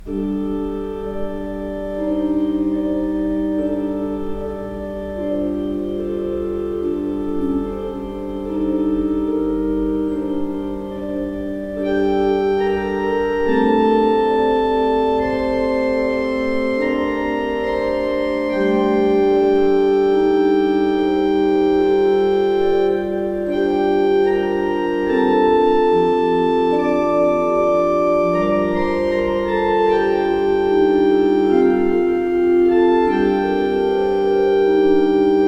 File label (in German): Breil, gebrauchtorgel